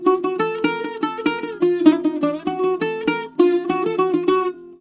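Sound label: D#, bouzouki; USB Soundscan vol.53; Mediteranean Traditions; AKAI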